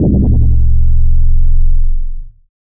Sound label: Sub Spring; FREE SFX PACK VOL 1